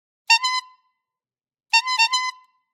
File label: Bicycles, Ring; lilesound